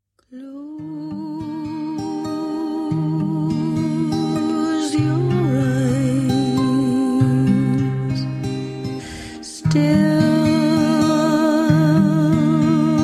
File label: lullaby